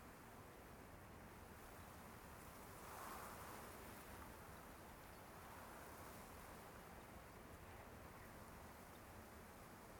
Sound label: tree wind